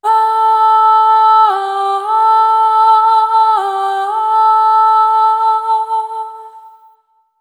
Single Voice Melodic Loops, Vocal Samples

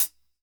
TING LP; AMG Gota Yashiki Groove Activator; TING LOOP 74

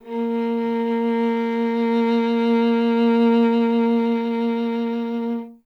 STR VLA, Viola

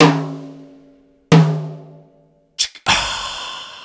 Korg Electribe ESX

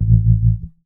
bass stab

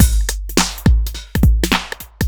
shuffle 808; Sonic Foundry (Sony Creative Software); Ugly Remnants VOL-2

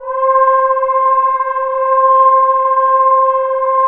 vox C5; Greytsounds; Scott Peers Sound Engineering

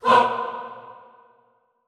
HAH B2, Classical Choir, short hah; Best Service

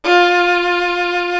SOLO VIOLIN; East West; Global Sounds

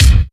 Lo Rx7, Kick Drums; Zero-G; Soundclash

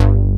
BASS, Synthsonic; Pro-Rec - Synthsonic; BASSES

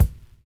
LOVE SNAR; Univers Sons

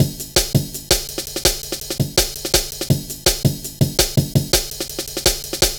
Drum Loops, 165.5; Jungle; Zero-G Jungle Warfare 1, 2 & 3; Scotti; 90 s Sample CDs

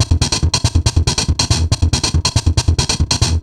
Treat Guit, Industrial Nasty

